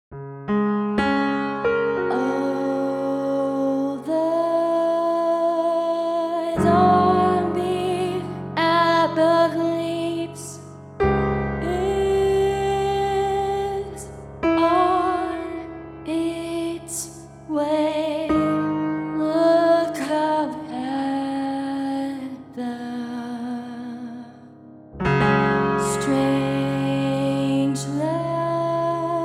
piano 17; 17-ET

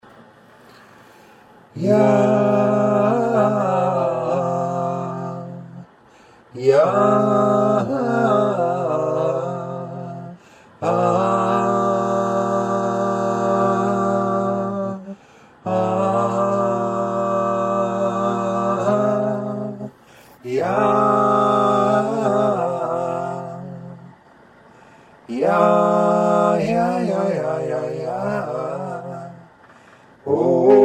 voice adaptive, melodyne

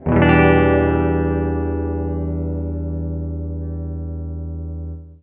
e mn7, guitar chords

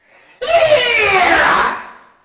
yeeah; drums

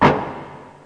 hihat, drums

